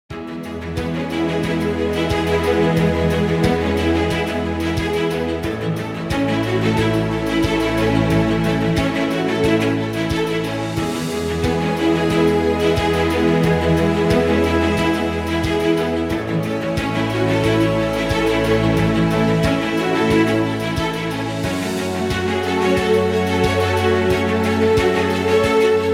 Motivational Corporate Background Music

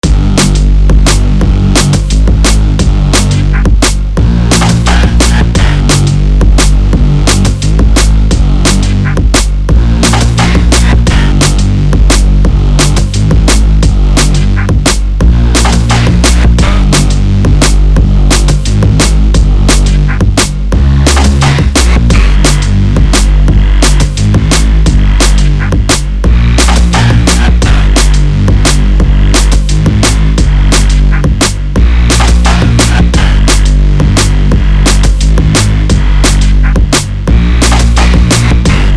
Wet sine

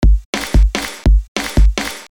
Drums sample 146 BPM Arabic rhythm

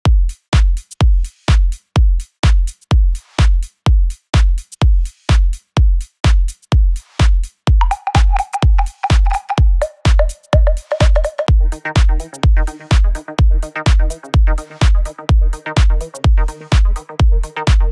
Bass House

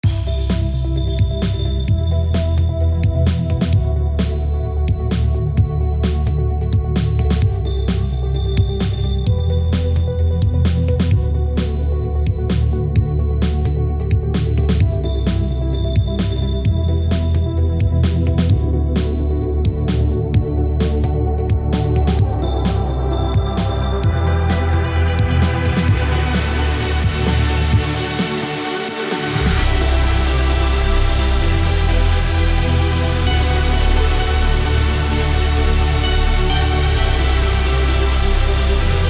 trance

